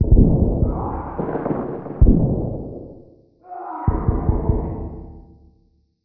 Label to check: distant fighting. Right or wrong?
Right